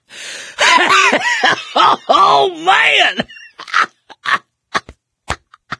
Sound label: laughter, mechanic, survivor, voice; source engine; left 4 dead 2